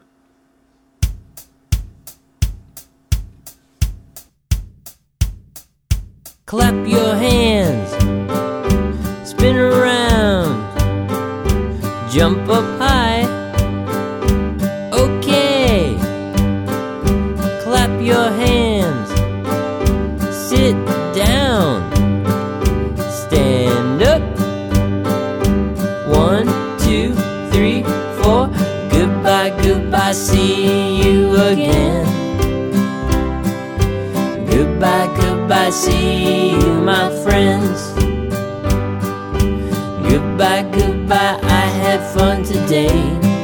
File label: Goodbye Song